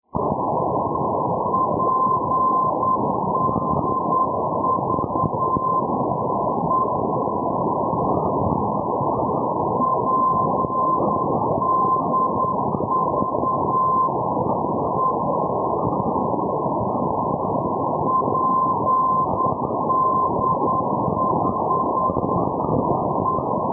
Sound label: ndb plovdiv; pdv-450; europe